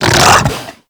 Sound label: Infested Ancient Run Breathe; Warframe